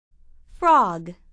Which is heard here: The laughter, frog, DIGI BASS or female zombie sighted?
frog